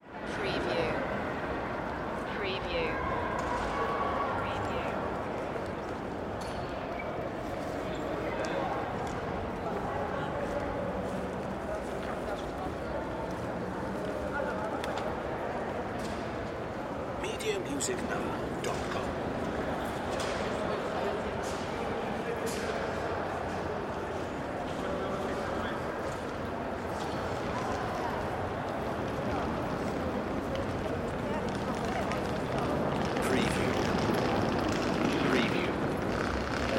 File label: Train Station Lobby; sound effects bible